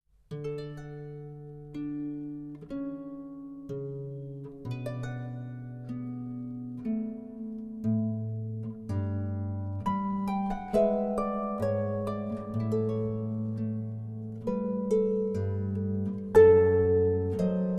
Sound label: Air, auf Harfe & Hackbrett; Johann Sebastian Bach